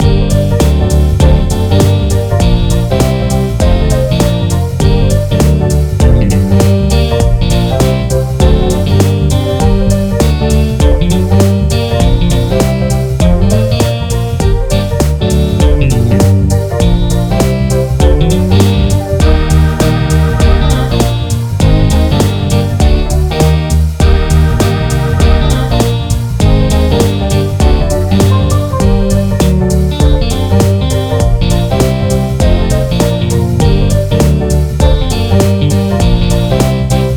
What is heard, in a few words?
electro swing